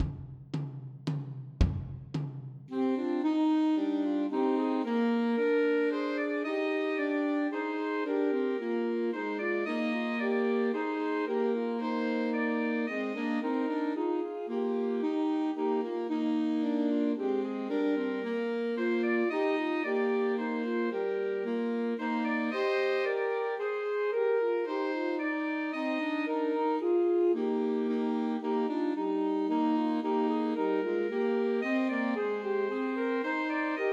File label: Trios for Three Alto Saxophones; The First Nowell; Christmas